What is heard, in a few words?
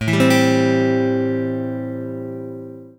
Am7